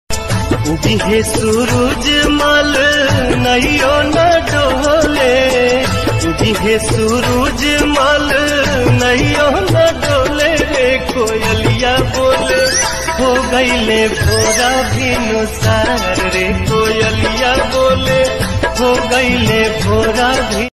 chhath puja ringtone bhakti ringtone bhojpuri ringtone